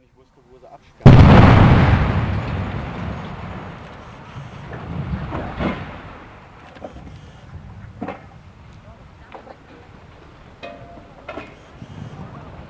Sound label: Explosion